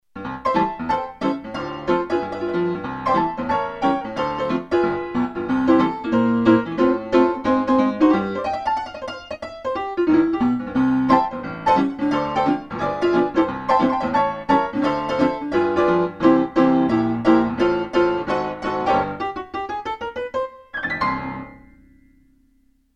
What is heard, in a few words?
Honky Tonky; gsi gemini new samples